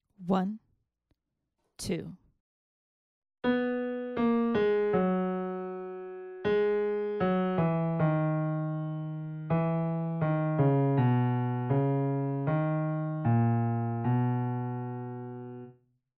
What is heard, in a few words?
melodic dictation